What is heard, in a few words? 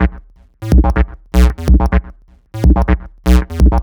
Low Wow, Bass; Sonic Foundry (Sony Creative Software); Underground UK House & Electro